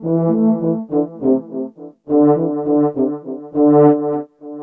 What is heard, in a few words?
French Horn; Monkey Funk